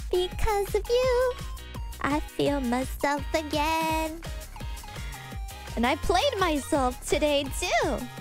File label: Singing; IRySinging